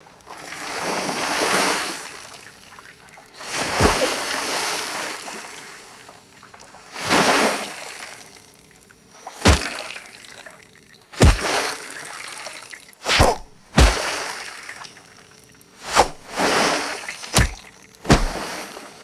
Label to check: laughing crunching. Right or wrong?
Wrong